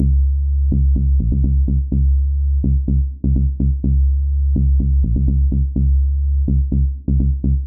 Tech House Bass Rhythm Sustained and Punchy, C sharp; loops